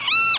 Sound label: win Aquarium Exclamation